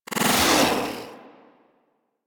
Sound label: Teleport Out; Warframe; Teralyst; Ropalolyst; Abilities